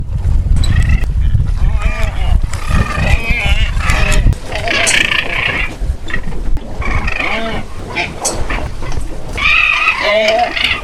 frigatebird

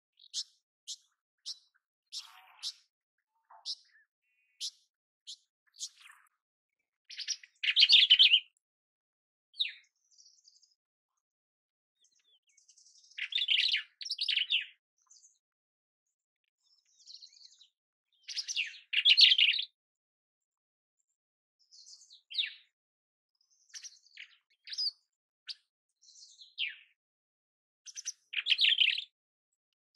Pied wheatear; چكچک ابلق

